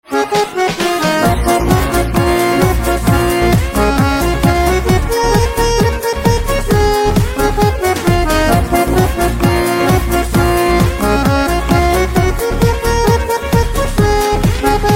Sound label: Harmonium Instrumental Ringtone